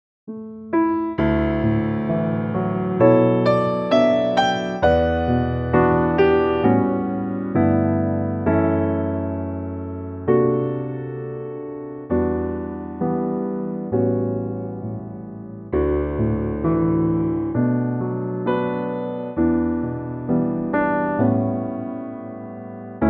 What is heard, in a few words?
D Piano; key - D; vocal range - F# to Bb